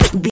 gun silenced semi sub shot

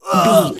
voice male grunt pain

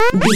retro collect pickup item

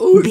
seal walrus hurt

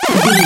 retro laser beam

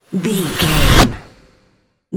magic conjure charge

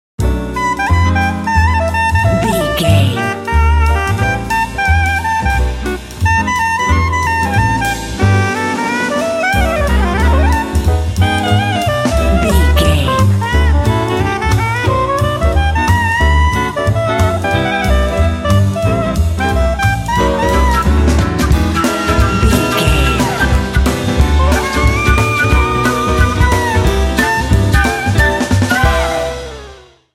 jazz happiness

